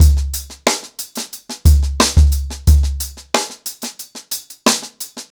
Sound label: 90BPM, ACOUSTIC DRUMS